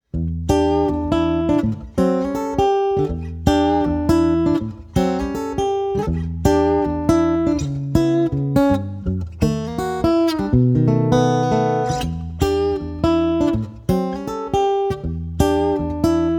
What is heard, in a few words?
baton rouge fjec cherry